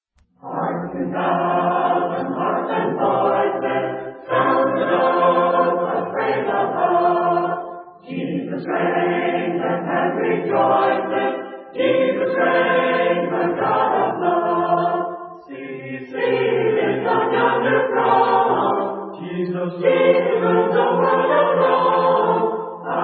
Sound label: a capella hymn; hark ten thousand harps and voices